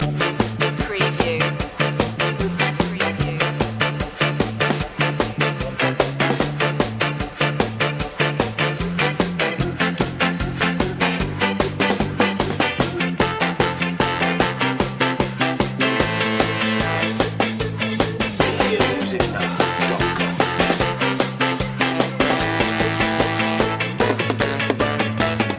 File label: ska